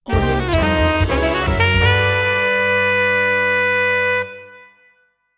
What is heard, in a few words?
stylish jazz sax logo